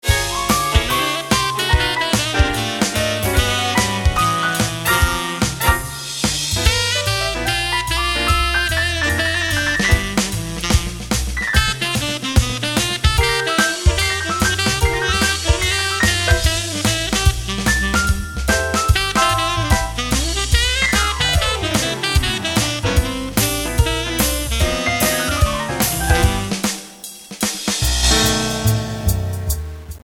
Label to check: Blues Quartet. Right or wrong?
Right